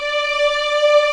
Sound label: D5 LEG MFI R, String; East West; The Ultimate String Collection